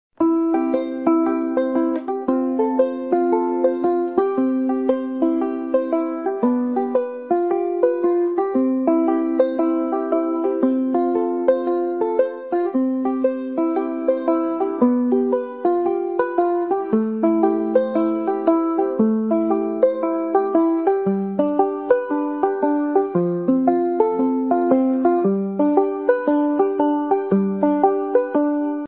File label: bgm; 俄罗斯方块